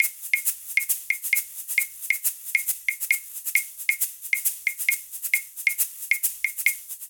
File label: Rhumba Shaker & Claves 135bpm; Latin Percussion; Ableton; Factory Packs